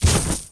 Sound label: drop item